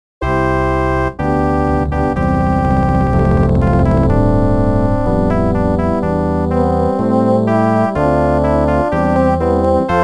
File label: Name Register